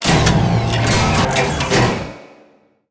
door stop

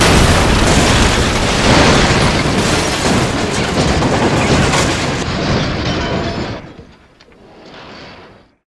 crane crash

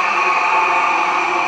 "oooh"

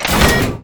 button

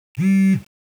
iphone message; dm cardboard

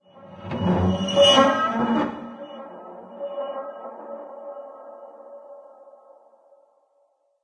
metal creak; subchamber

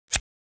scissors; dm cardboard

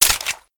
p228 clip out, weapons; kuilu

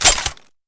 shotgun shells eject; weapons; kuilu

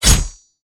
knife hit wall; kuilu

